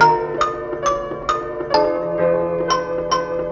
keys

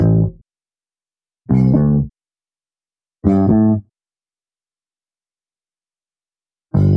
bass, Phonky loops

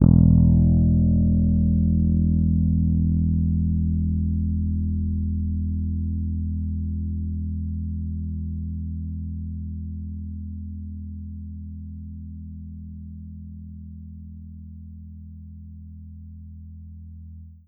guild, Bass

